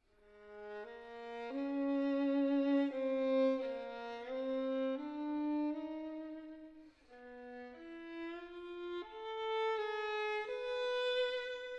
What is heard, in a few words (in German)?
Sonate fuer Violine und Klavier 2. Satz Beginn; Cerha